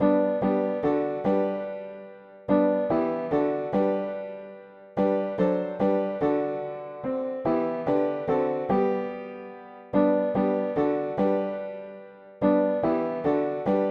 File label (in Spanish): mono piano; hymns; Himnario pequeno